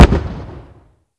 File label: Inf Weapons; Red Orchestra 41-45